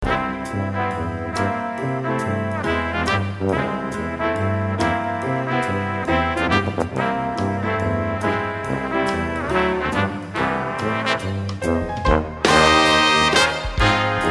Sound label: string